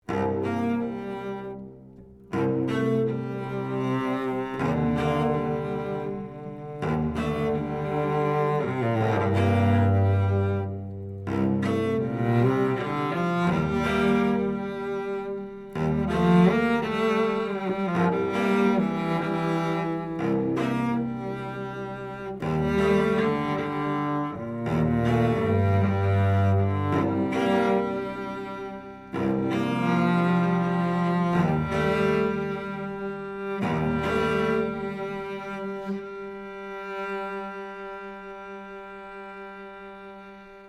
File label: cello classical